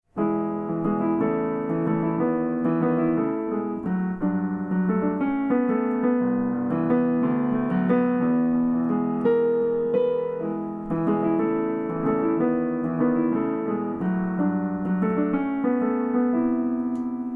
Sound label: piano